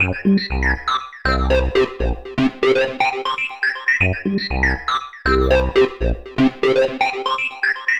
acid sequence; Sonic Foundry (Sony Creative Software); Ugly Remnants VOL-2

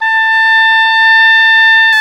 oboe, mf f; Roland L-CDX-03 Disk 1; Oboe 2&3

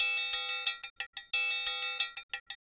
Cajun Triangle; Sonic Foundry (Sony Creative Software); Joe Vitale Latin Percussion

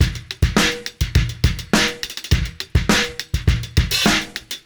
Beat Loops, 103; Zero-G; Monster Beats

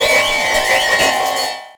SPRING, Anime Sound FX; COMIC

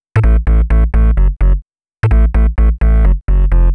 Bass, uk garage, 128bpm

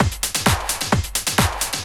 drt 130 addon, DRUMLOOPS, LOOP DANCE

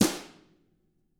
BRUSH, Drums; DW+WFL BRUSH; ILIO; Double Platinum Drums 1

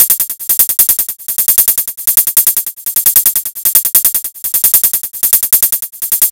114 PERC, PERC LOOP, Fresh Disco House; USB Soundscan vol.20 - Fresh Disco House I

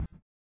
collision; Spectrasonics; Metamorphosis

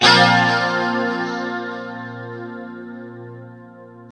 2CHD A#3, Guitars; Spectrasonics; Hans Zimmer Guitars VOL-1; JC-SHEEN

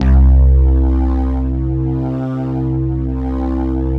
Bassnote, Prototechno; Sonic Foundry (Sony Creative Software); Basses

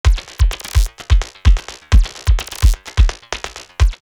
Mach 4 128, drumloops DrumMachines; X-Static Goldmine

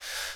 BIG BREATH, MALE; Northstar - New Gold VOL-2

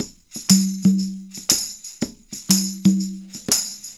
SD tam con; Percussion

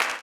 CLAP, Blues Soul; Spectrasonics Vocal Planet

